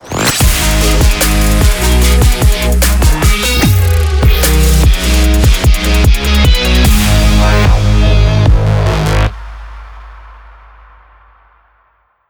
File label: 149bpm G INST; NPO 3FM x FunX IN ACTIE VOOR GIRO 555